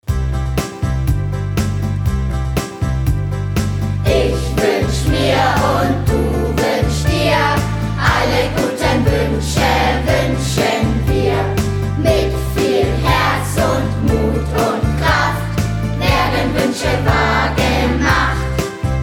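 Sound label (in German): Wunschfängerlied mit Chor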